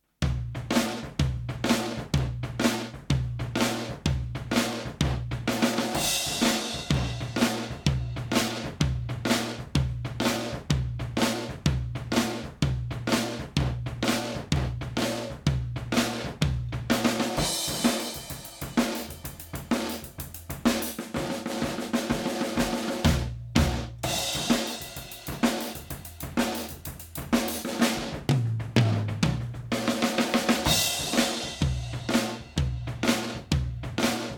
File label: drums